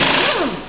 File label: air wrench; The sound of an air impact wrench; VANPELT SALES LLC